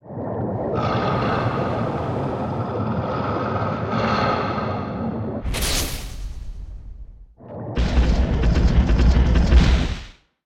Appear

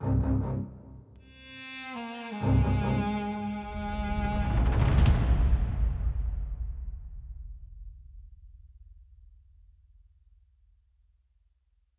Action Desperate Escape 100bpm Intro; project zomboid

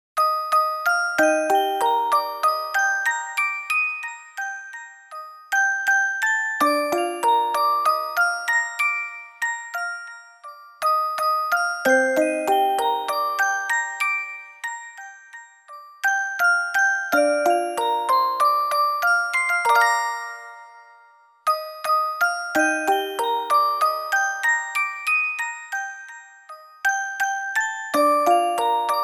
musicbox; Talk to Talk

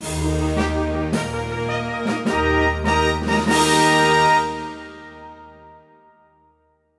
Fanfare World Record

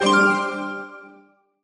Reward; Geometry Dash

